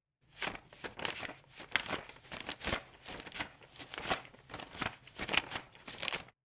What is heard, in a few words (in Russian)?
zvuk deneg